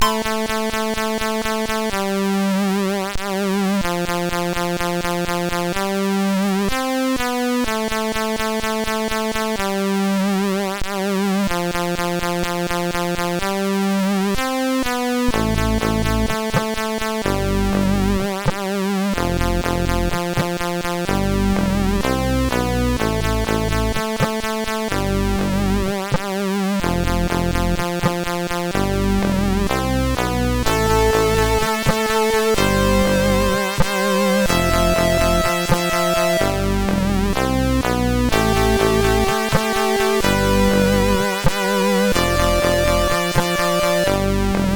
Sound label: Spooky, sid MOS6581R4